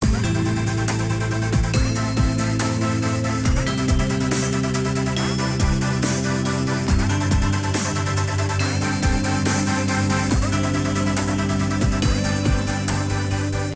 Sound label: melody; Party Night